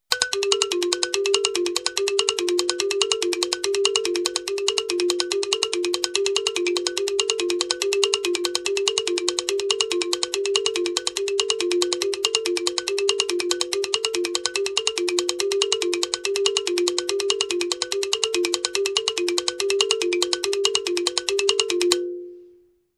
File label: Reptitive Mallet loop